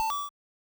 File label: Mile Pickup short; SFX; Airport Runer